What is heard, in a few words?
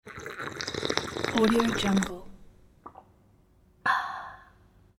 girl slurp